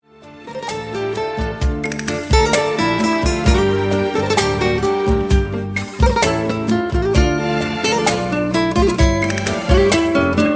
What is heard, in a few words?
non vocal